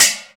PRC LID, Trash Tuned; Roland - Rhythm Section; Trash+Kitch

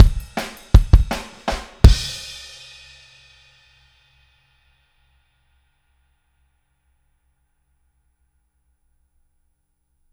Outro Kick, 162 BPM, Drums; Drums from the Big Room The Mixes; Take Your Toms Off

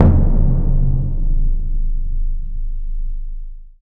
PRC BIG; Roland LCDP14 Africa VOL-2; Afro Menu 1

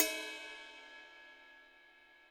HiHat & Crash, Roland TD-20; Crashes; HiHats

